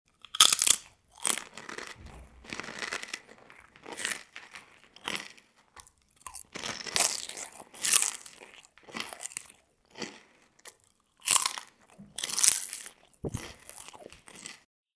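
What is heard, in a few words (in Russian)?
хруст чипсов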